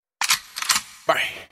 Bang Shot

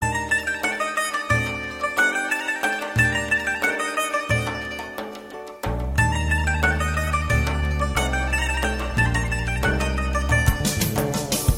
Best Oriental

